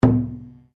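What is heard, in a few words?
Drum Taiko forte, Japanese Taiko Drum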